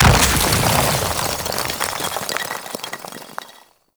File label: Exterior Ice Chunk Explosion C; Ambience; Gameplay; Warframe; Corpus Railjack; Comet Shredder